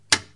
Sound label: toggle; 8800 simulator